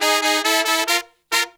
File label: Pop & Funk Brass